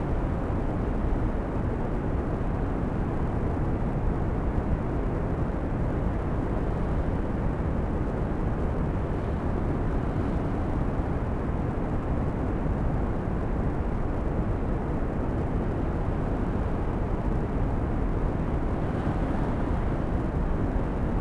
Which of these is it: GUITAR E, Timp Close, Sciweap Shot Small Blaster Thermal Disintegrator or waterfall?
waterfall